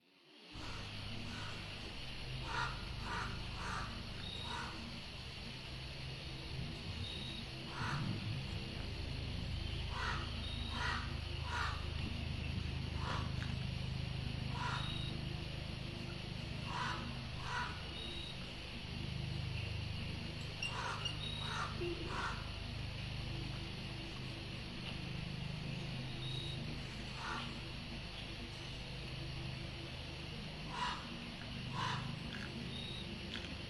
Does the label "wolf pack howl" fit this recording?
Yes